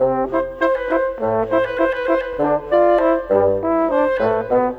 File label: Brass Winds; Cinematic; Orchestral; Sonic Foundry (Sony Creative Software); Orchestral Series VOL-3 - Cinematic